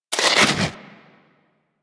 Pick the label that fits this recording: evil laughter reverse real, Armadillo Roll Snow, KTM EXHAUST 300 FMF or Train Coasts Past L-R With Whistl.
Armadillo Roll Snow